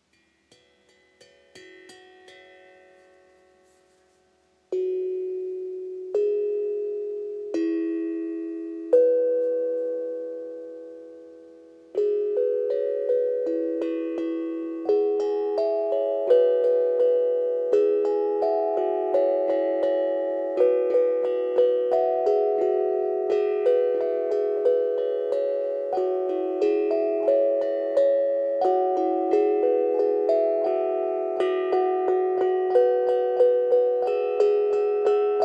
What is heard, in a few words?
Tongue Drum